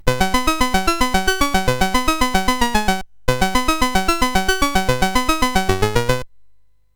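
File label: Moon Patrol, MOS 8580 SID chip music, games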